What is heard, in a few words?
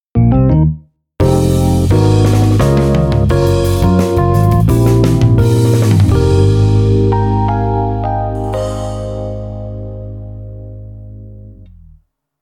jingle